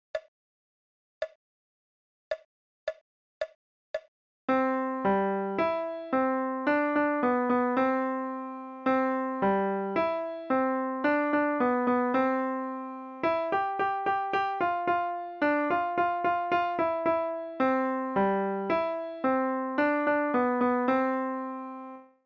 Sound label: Gray Squirrel